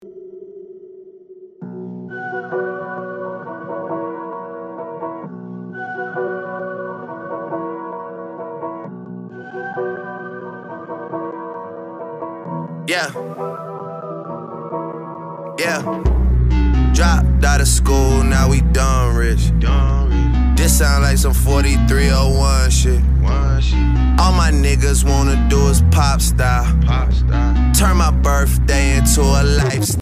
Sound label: pop style